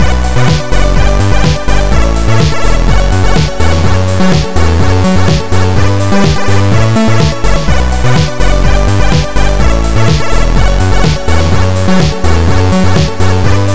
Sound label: chiptune